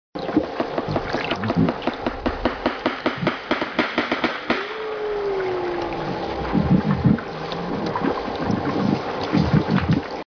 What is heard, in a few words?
walrus